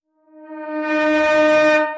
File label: ancient resonant call; ominous